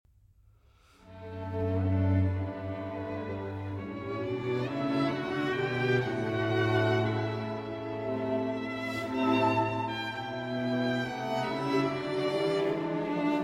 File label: String Quartet in A Major III. Adagio Molto